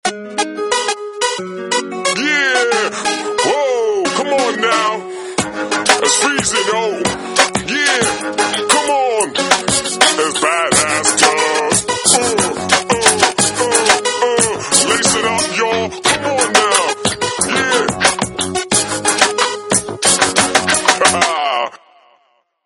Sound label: Hip hop guy; Samsung GT-S3030C Tobi